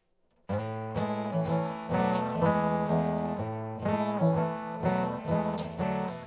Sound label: acoustic guitar formant shift 18 semitones up